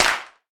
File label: Clap One Shot F# Key, Royality free clap tuned to the F# note. Loudest frequency: 2737Hz; single hits one shots